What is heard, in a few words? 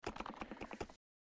Ptera Freindly, Ptéranodon; Jurassic Isle RP